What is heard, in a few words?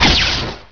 Staff Ready; Heretic II Demo